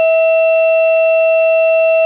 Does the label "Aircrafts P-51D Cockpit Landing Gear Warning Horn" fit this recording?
Yes